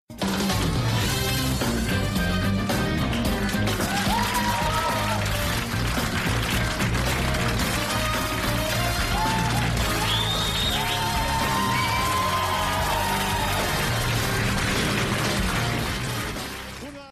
tune; dwdd

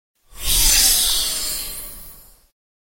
hiss sound

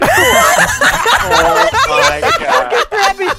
laughing

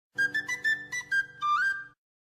nose flute

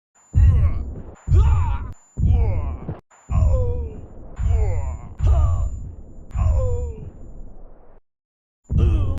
scream

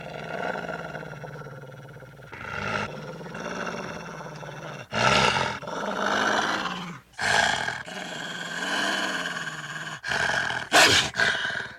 werewolf snarling

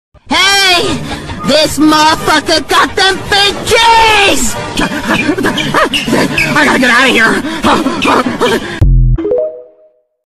hahahahahaha you

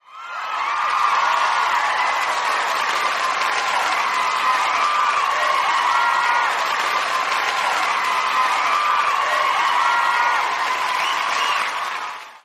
crowd

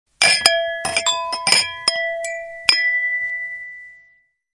bottle toast

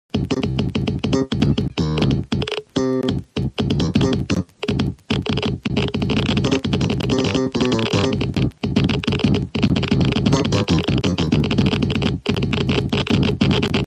jazz

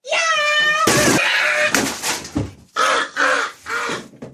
yaaaah

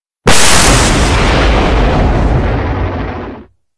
loud lightning strike

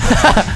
shot laugh; vanoss

